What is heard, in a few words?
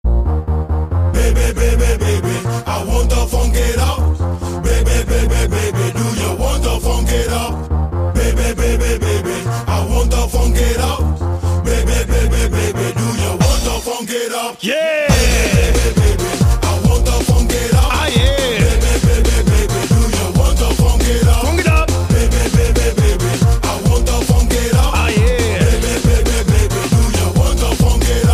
Funk